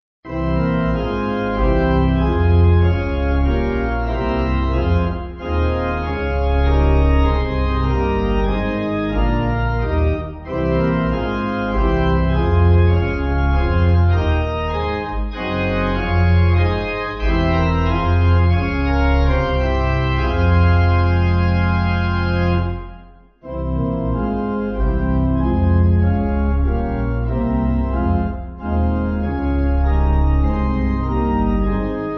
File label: Pipe